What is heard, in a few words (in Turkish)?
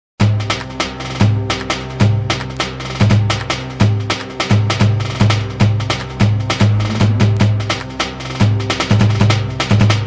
ritim, gw8